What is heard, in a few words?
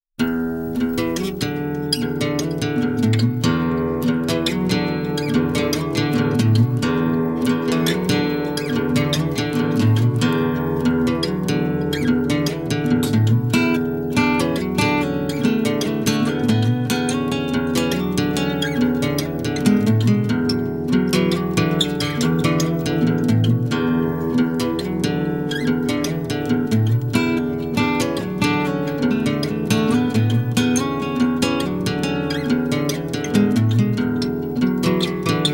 Live Radio Acoustic; Live Radio WAAF Acoustic; 2002; BonusCD